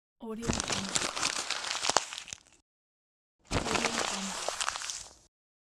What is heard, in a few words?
crumpling of paper